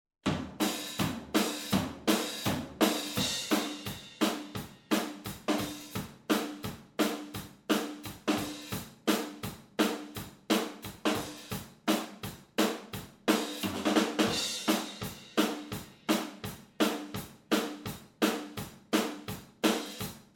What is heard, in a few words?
Drums MONO Blumlein